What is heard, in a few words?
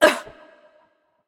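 ugh, female; smite; voicepack; hera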